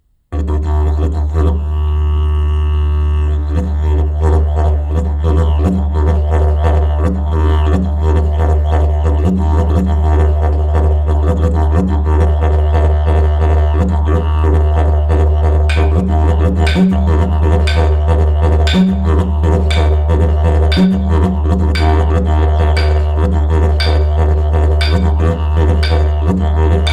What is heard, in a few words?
Yidaki, didjeridus